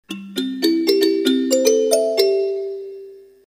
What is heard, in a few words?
mbira